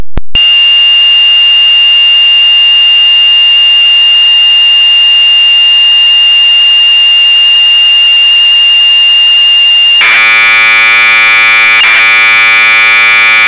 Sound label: fire